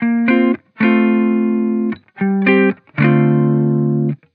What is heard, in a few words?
Vibrations Pop Guitar Loop 110 BPM D Min; Cymatics; Vibrations Guitar Sample Pack